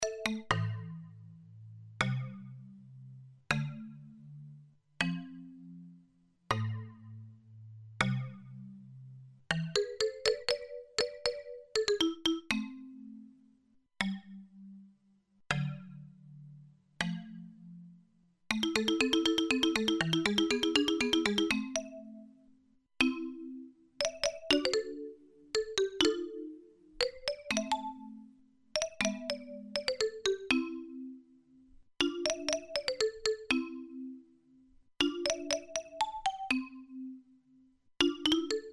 Blofeld Marimba

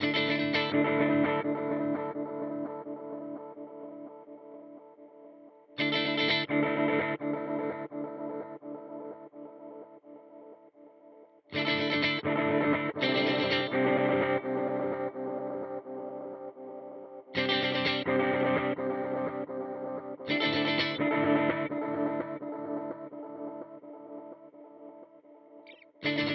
Memory Boy Gain Delay

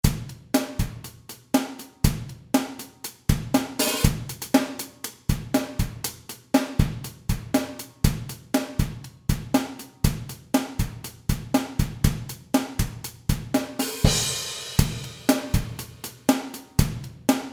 session drummer

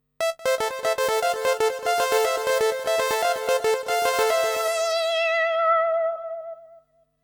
Electro Lead

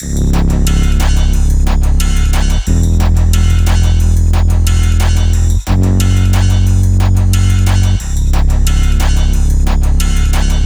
SPD SX; Bazzz

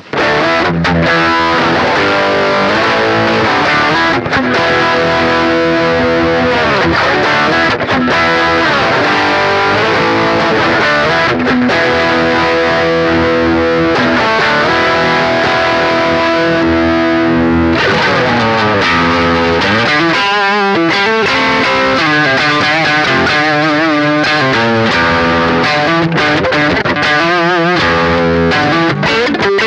Vox MV50 Direct Treblebooster On

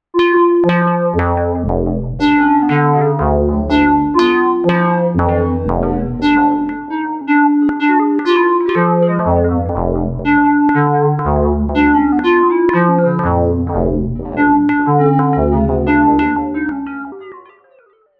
Airy Squares; Cherry Audio Mercury-4

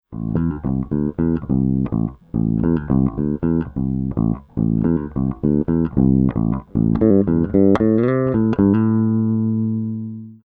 Dirty Midrange

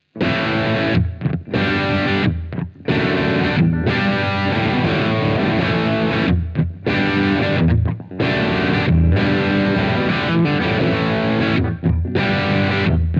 Blackstar St. James Comb USB; Architect Software

